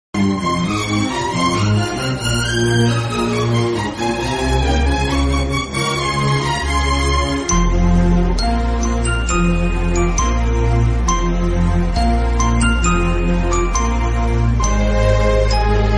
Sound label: climax bgm ringtone; manmadhan